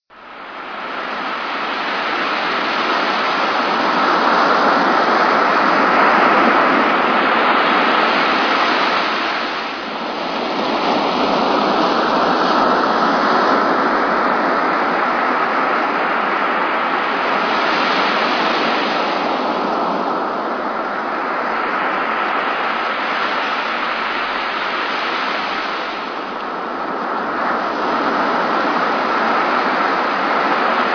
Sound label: ocean sounds; LIBGDX